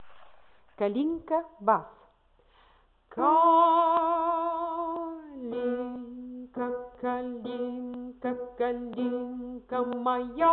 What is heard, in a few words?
kalinka bas